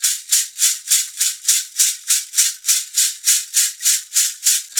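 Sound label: perc african shaker, shaker loops; Roland LCDP14 Africa VOL-2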